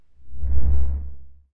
Woosh Ukulele Slow

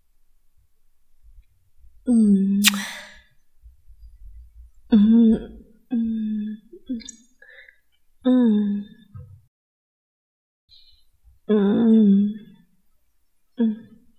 Beso Kiss

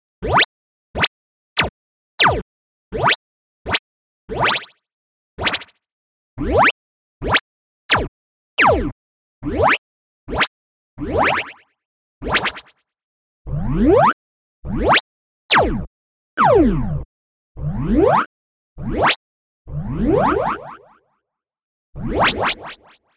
Double Bass Bowed Rucksack

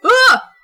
Wah

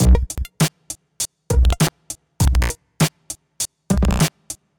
Glitch Beat

